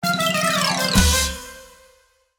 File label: circus melody light